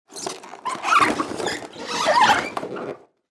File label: Designed cinematic wood textures squeaky movement